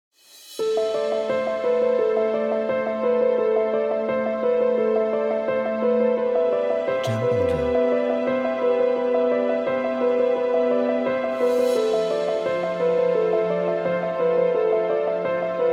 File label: Dramatic Trailer